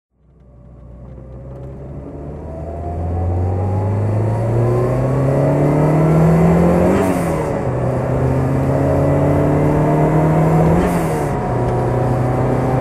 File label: lancia delta HF driving; Lancia Delta HF Integrale 16V, 1990; Engine sound driving; V3D/ ClassicarImages; Marc Vorgers